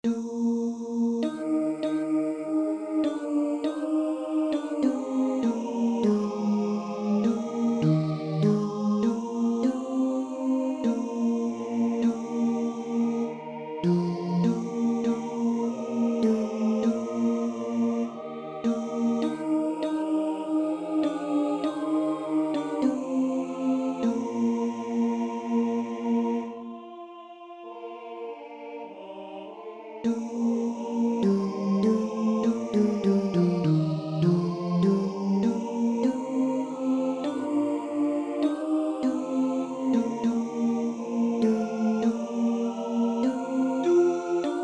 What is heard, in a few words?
Tenor; O-Quam-Kyrie